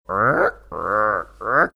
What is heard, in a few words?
mimicking sound of creaking springs